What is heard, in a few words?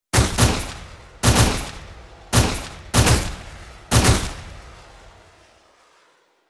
Bullet Time; FXHome; Bullet and Gun